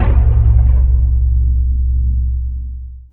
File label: aether drum, Single Shots; Sonic Foundry (Sony Creative Software); Ugly Remnants VOL-2; Beatstuff